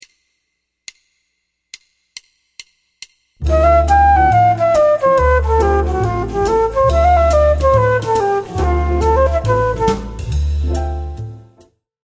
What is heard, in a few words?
bebop scale